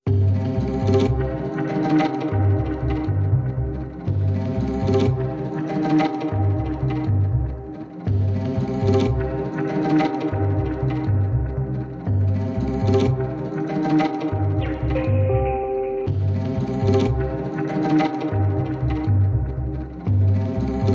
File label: Beatless